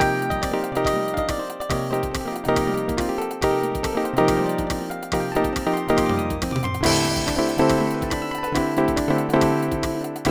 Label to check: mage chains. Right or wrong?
Wrong